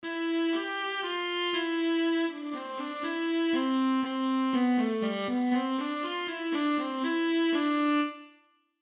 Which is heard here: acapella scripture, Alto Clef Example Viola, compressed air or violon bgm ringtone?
Alto Clef Example Viola